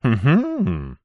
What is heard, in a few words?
human male laugh huh hmm